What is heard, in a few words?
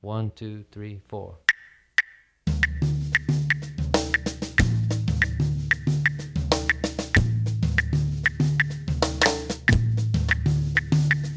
guaguanco variations